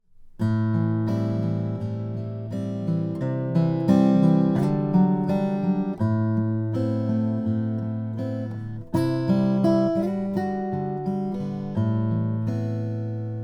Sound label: petros tunnel 13 salvaged redwood